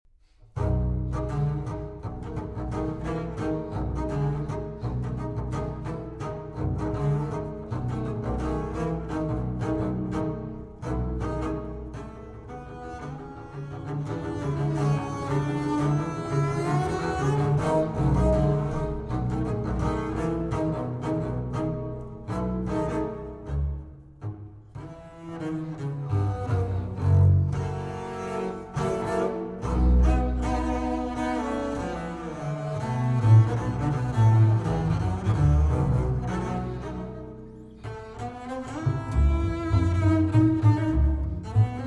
Double Bass Duets

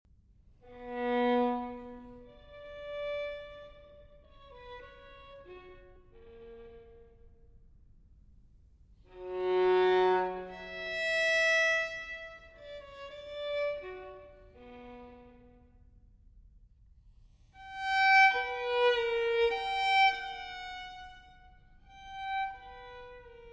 Solo Violin in E-Flat Major Largo; TWV 4020; Telemann Fantasia

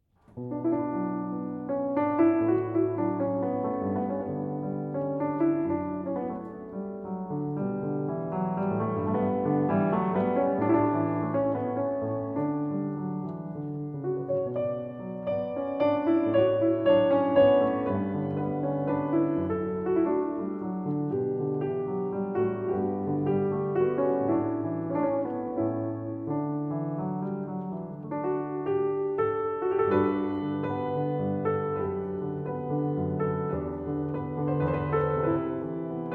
Transcr. for Piano; Sì dolce è'l tormento, SV 332

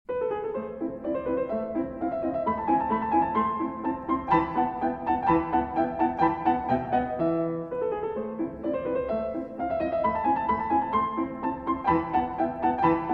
Piano Sonata in A Major, Alla Turca, Allegretto; Mozart Piano Sonata No. 11 in A Major, K. 331 Alla Turca; III. Alla Turca - Allegretto

